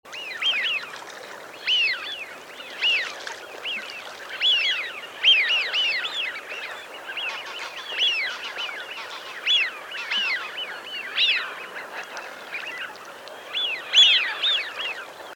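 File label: sviyaz voice, Eurasian wigeon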